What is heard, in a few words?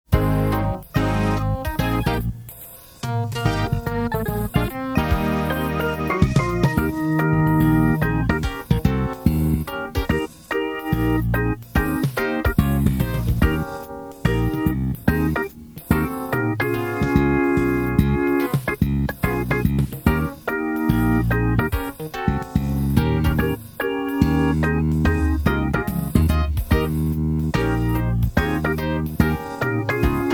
Dont Rap It Bag It WITHOUT melody fade